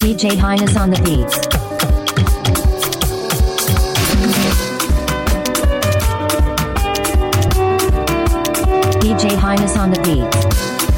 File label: Mara FeedBack Beat